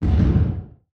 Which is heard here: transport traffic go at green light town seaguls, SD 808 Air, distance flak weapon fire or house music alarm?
distance flak weapon fire